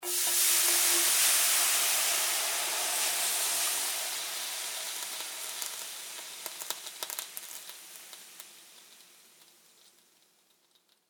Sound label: Water Sizzle Water Pour On Hot Pan; Pouring Sound Effects; Pouring (Sound Effects:Objects); SFX Bible 6